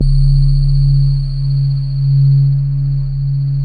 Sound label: PAD, Synth Pads; SYN-PADs; Synth-Pads 4